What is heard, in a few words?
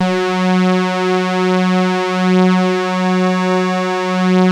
TARK SAW, Synth